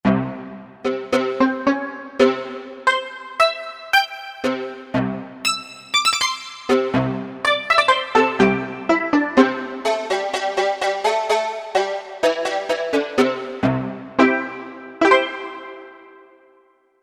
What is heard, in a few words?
Japanese, Korg MSC-1S; Korg MSC-1S Variety